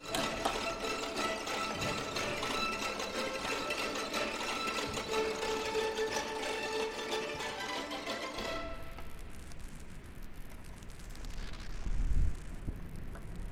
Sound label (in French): bruits etranges col legno; Berlioz; songe nuit sabbat